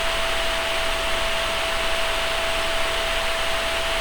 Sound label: powerleap